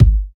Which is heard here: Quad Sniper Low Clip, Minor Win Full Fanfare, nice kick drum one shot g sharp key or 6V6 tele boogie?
nice kick drum one shot g sharp key